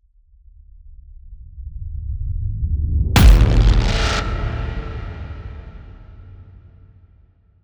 Impact, Sound Design, Whoosh to Hit, Glitch, Whoosh Up, Rumble, Long, Resonant Hit, Glitch Accent; Two Tone; Airborne Sound; Crisis Accents